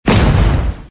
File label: boom; zhengjing